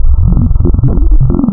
PPG UPPER, wavetables

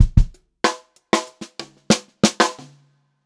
groovy fill, Funk-Groove Drumloops; USB Soundscan vol.38; AKAI

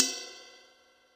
Rid B Drk, Cymbal, Yamaha DTXpress

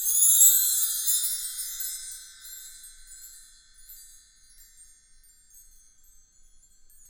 PRC CHM, Wind Chimes; Roland LCDP03 Orchestral Perc

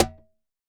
Cajon Bass Slap; Best Service; Festa Latina VOL-1; Single-Hits

